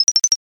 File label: bat